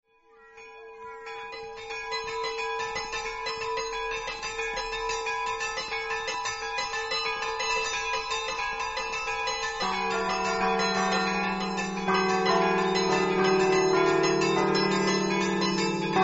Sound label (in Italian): campane